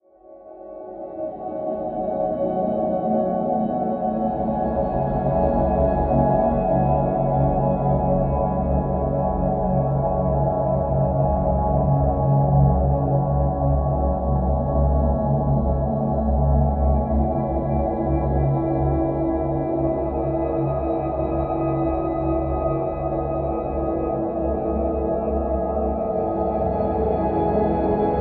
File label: Ambient Wave